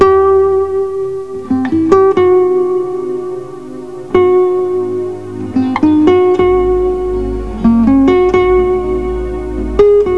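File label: acoustic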